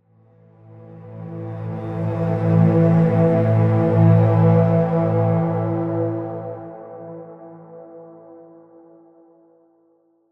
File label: Tone Organ; The Last of Us; Tone Organ Explore Match Intro